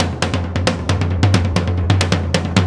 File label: Tom Particle, Processed Drumkits; Zero Gravity Beats; Particles